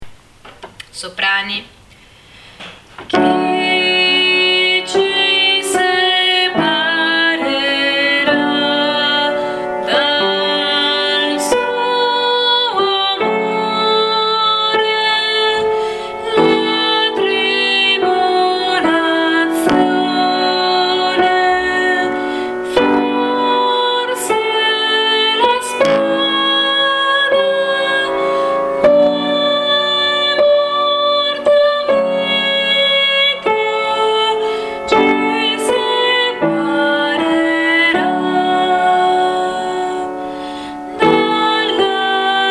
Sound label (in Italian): separera soprani